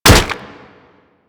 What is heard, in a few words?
Staff Impact Flesh